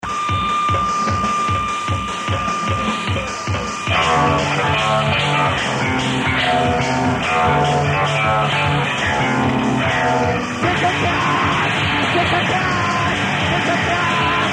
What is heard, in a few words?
disco chaos